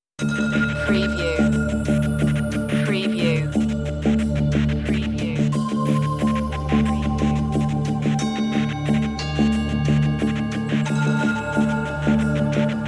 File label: underworld series dramatic cue